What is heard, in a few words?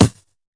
tight hit, Tight n Hard Kit; style kits